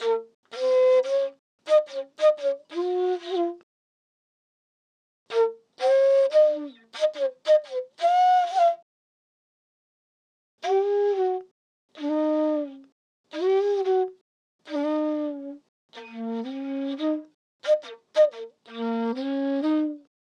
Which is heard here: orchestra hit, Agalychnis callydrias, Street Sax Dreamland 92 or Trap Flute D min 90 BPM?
Trap Flute D min 90 BPM